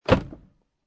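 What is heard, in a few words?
Car Interior Door Open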